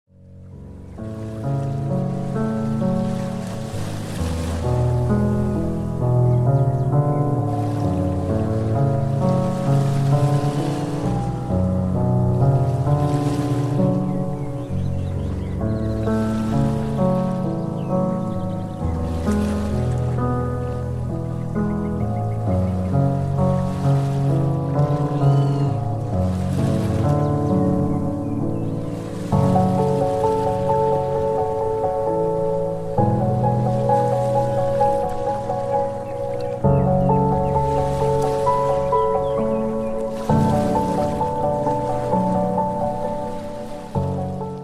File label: Peaceful Instrumental Music